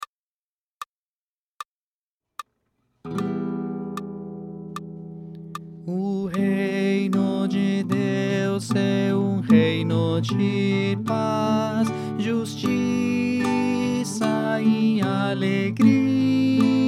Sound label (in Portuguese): O Reino de Deus Tenor